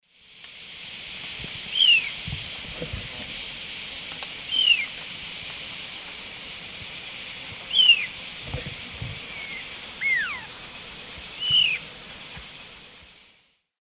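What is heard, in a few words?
Myrmeciza fortis, call juvenile; S. America; Eastern Lowlands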